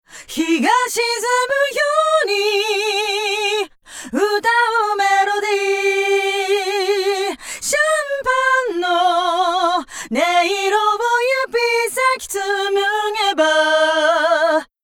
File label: Chorus Flanger